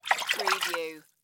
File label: Splash Bath Tub